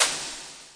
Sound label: brush roll, Roland TD 7; DRUM MACHINES